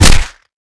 punch hit player; weapons

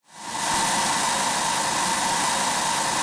ah64d jet; wac

